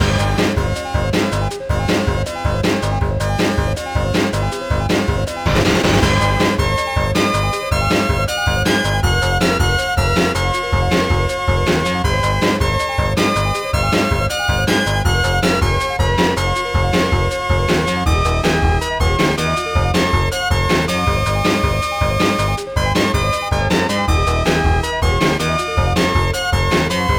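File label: background music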